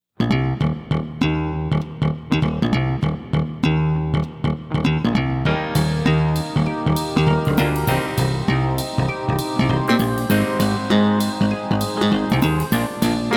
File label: accomp track